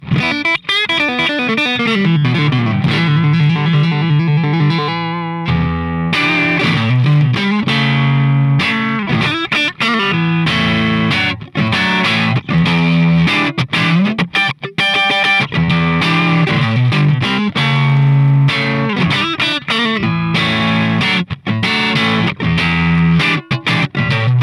TAD Blackface 22 reverb

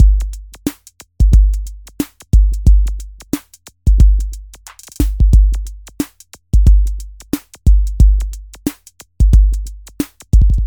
drums; ersdrums

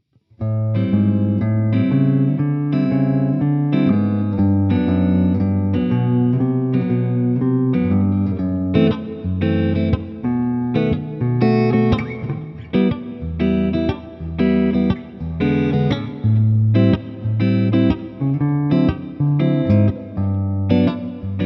chords front pu; ibanez artstar as153b

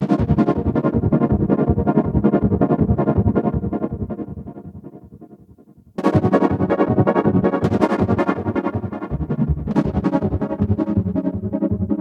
seq pad; yamaha mx49

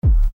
kick clean

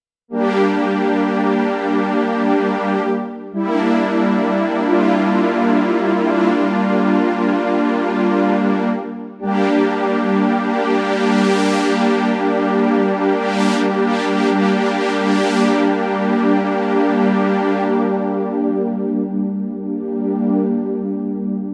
king korg brass pad

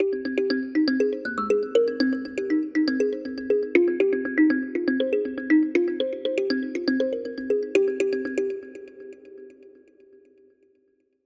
melodic kalimba arp; heavyocity aeon

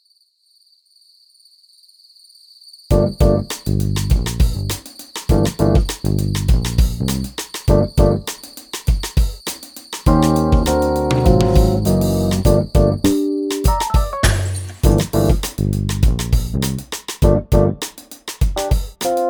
rhodes drums j-bass x-treme fx band; motu machfive 3 testbericht; stardrums

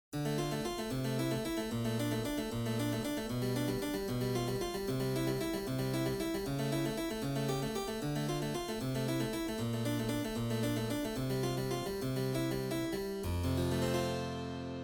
yamaha cp40 stage harpsichord

